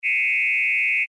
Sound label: triller 1000ms; hinz